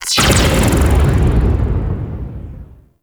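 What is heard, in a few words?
Ball Score Burst; Warframe; Tenno Ball; Missions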